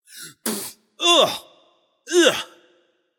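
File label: nonverbal clear throat; smite; voicepack; merlin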